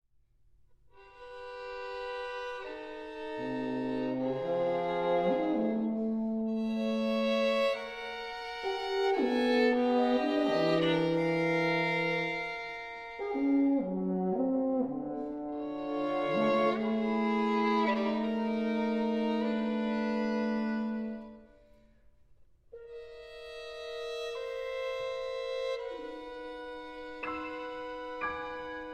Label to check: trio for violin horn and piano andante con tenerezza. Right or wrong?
Right